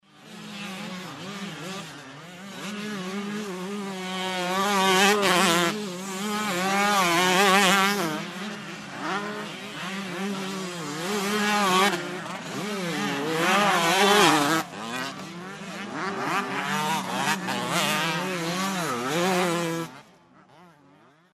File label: Motocross, motorcycles passing by; motorcycle racing